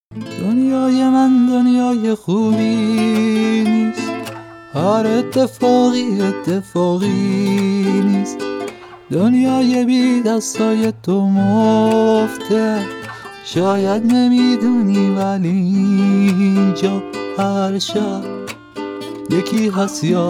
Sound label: Guitar Version